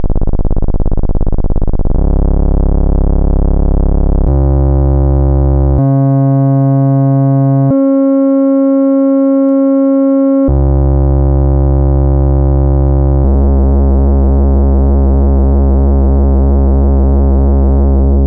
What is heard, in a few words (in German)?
Behringer 110 VCO Rechteck Range Pulsewelle Mod und Filter